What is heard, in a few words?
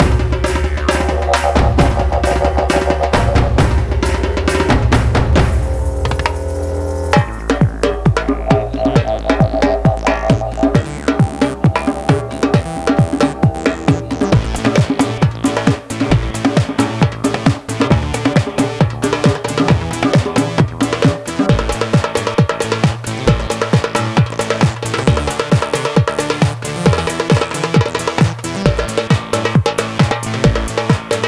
Tribal Boucle; Imagine Modern Dancer; Nintendo DS